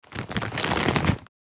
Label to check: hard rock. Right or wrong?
Wrong